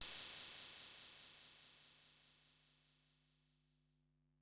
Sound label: Noise High, Korg Wavestation-SR, Drum Kit